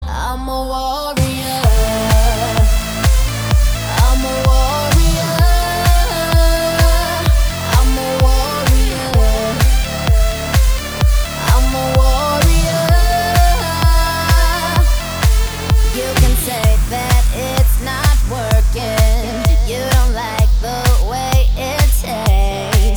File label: Mobile Ringtone